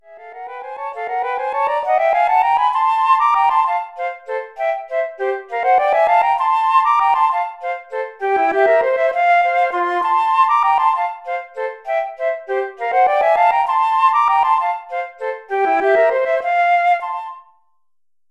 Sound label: flute duet unaccompanied